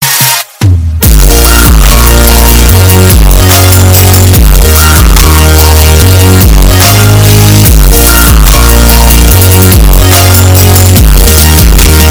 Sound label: outro song bass